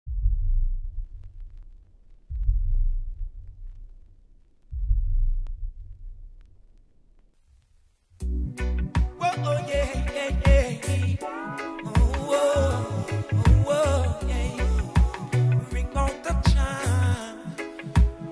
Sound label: Roots Reggae to Dancehall Ragga